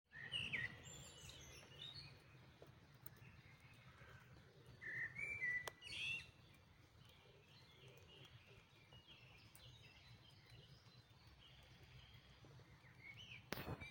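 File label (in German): Dawn Chorus, Vogelgesänge vor Sonnenaufgang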